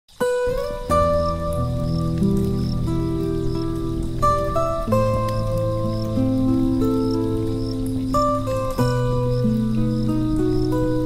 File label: Kithara